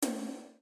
instruments drum tom; maoudamashii

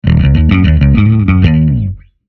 instruments bass; maoudamashii

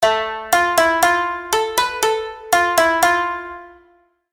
jingle; maoudamashii

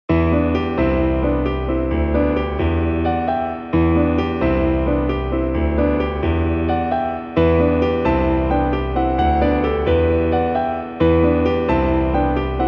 piano; maoudamashii

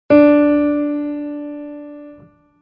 instruments piano re; maoudamashii

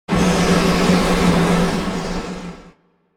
element darkness; maoudamashii

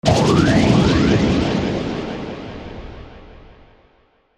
element fire; maoudamashii

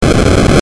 retro; maoudamashii

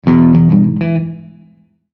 instruments guitar; maoudamashii

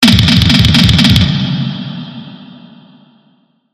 explosion; maoudamashii

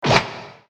battle; maoudamashii

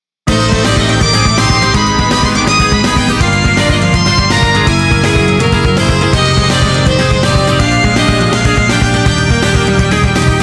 acoustic; maoudamashii

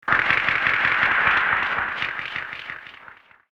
magical; maoudamashii

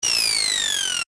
magical; maoudamashii

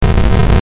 retro; maoudamashii

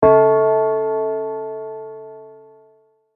chime; maoudamashii

